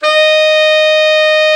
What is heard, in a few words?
TENOR, Tenor Sax; Tenor Sax 1